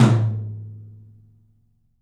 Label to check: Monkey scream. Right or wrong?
Wrong